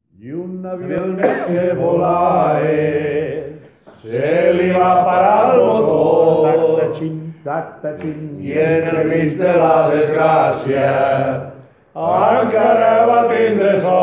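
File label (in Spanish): Avioneta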